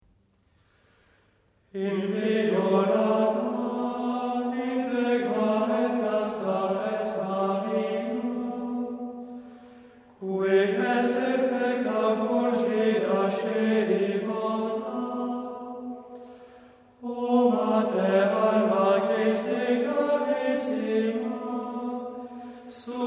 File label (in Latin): Inviolata Motet; musica angelorum